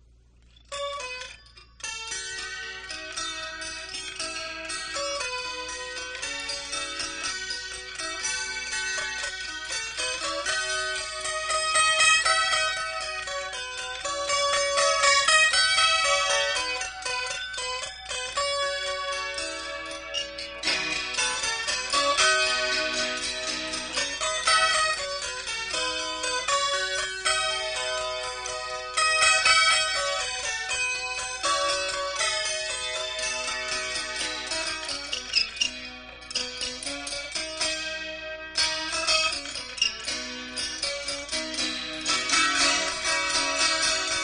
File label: Just Harp, Alone